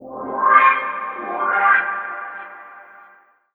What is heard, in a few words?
ORCHFX, orchestra, Asian Traditions